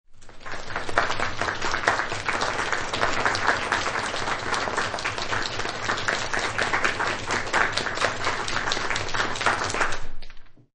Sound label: Small Crowd Clapping, Small crowd or studio audience: Applause. Light clapping, no voices